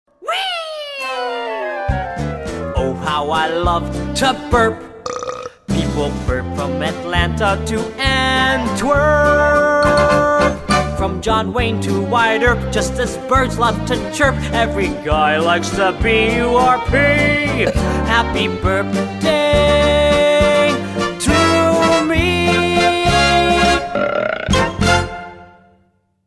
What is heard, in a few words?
burping